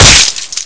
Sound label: headshot